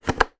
dustbuster handheld vacuum cleaner place on charging dock base